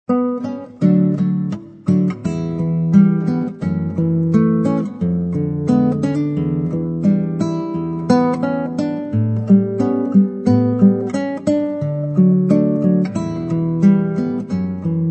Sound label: solo guitar